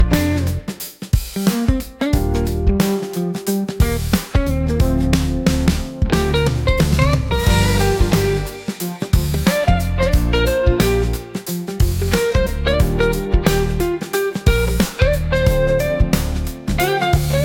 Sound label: Sapphire Groove, jazz soul